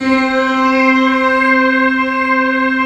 piano C4; ELECTRON

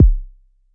kick, Novation Bass Station 2

